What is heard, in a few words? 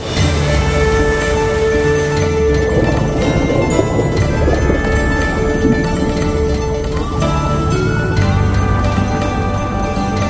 underwater swimming